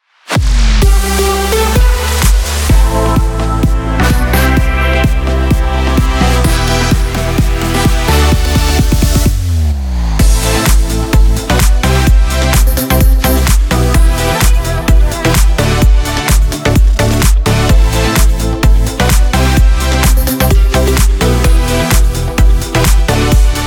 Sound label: Morning Opener; SWR3